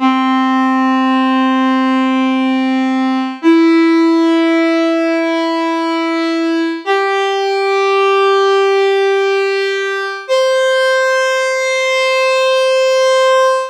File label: synth strings